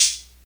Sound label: dry hihat sound f sharp key; hat samples